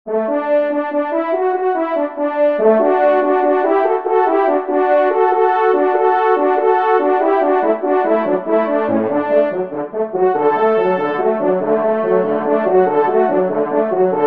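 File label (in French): duo, ensemble, trompe cors; Kling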